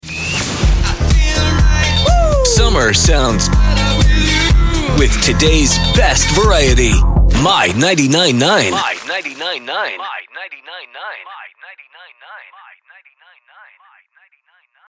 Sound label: IMAGING SUMMER FUN